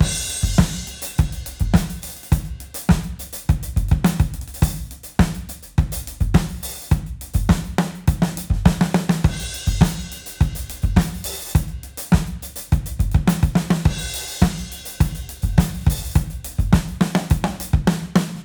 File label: room smash